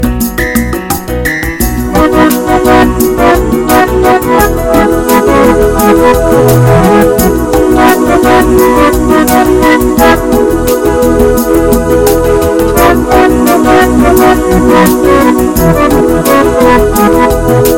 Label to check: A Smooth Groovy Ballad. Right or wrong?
Right